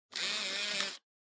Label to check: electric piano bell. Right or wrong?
Wrong